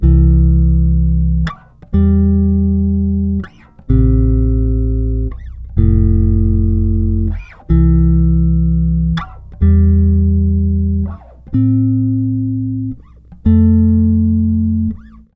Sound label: Bass loop Emo 125 C, Electric Bass Loops Direct; Slate Digital BASSHOLE Sample Pack